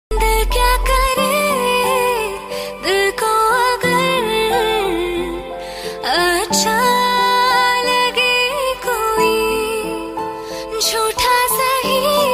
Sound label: Female Status Ringtone